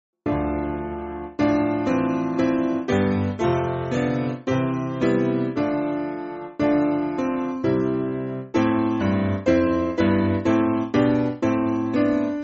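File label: Piano, Music Backing Files for Churches; What Wondrous Love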